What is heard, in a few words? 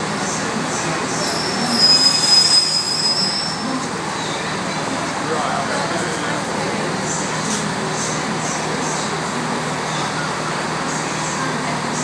ticking over